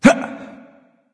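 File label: nonverbal surprised; smite; voicepack; ullr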